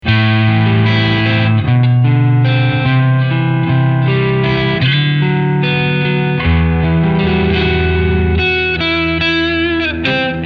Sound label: Crunch Delay